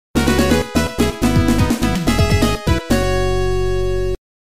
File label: music act clear remixed; sonic advance